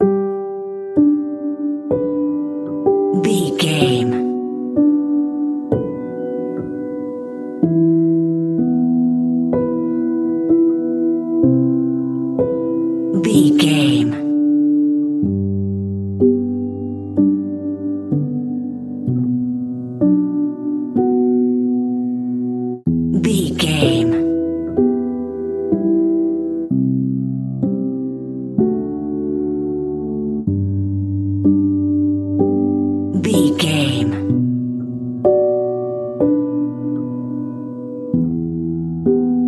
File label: piano only